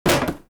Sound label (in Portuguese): Impacto caixa; Narrativa